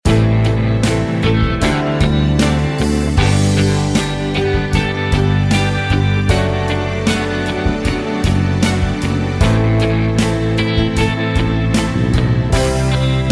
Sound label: anthemic pop rock 30 second mix; bbc